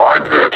injured, suppressor

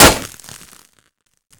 metal barrel sand impact bullet; physics

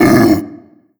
pain, barnacle; Half Life Alyx

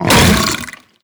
spit emit, barnacle creatures; Half Life Alyx

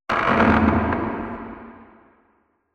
wood creak low; Half Life Alyx

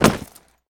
body impact; physics

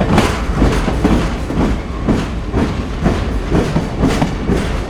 razor train wheels loop, machines; Half Life Model Pack; Half Life 2

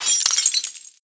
debris glass, bullet impact debris

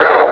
pain, suppressor

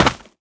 step run, footsteps, gravel

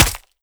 flesh npc, bullet impacts; physics; Half Life Model Pack

